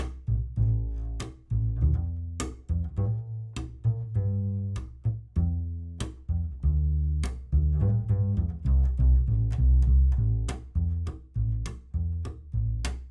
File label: Bass Mic